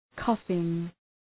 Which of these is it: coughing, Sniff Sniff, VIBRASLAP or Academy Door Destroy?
coughing